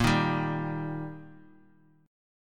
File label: Eb Major